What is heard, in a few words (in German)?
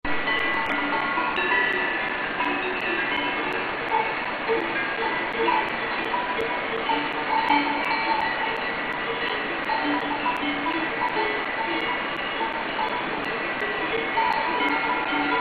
nachtglocken